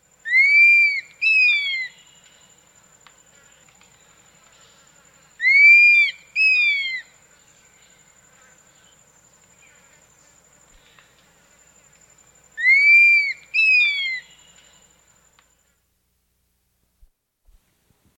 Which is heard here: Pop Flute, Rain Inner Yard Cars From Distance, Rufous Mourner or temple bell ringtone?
Rufous Mourner